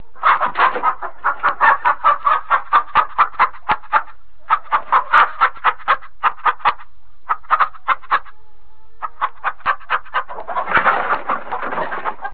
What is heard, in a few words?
chickens; farm animals; gcompris-qt